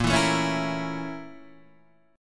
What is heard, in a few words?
A sharp Minor Major 7th Flat 5th A sharp; A#mM7b5 Chord {6 7 7 6 5 6} strummed